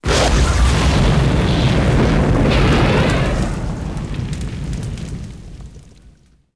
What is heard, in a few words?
explo fireball; impacts explos